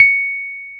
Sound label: C#6, Electric Pianos; Wizoo Electric Pianos